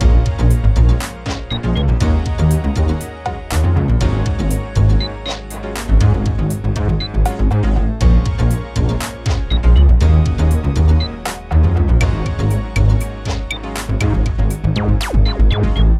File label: 80ies dry